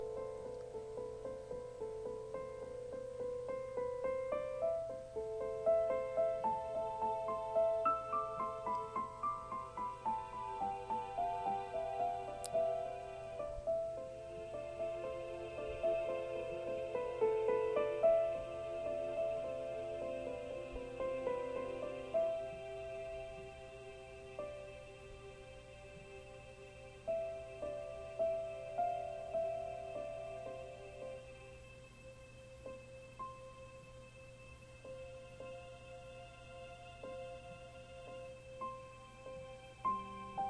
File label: string idea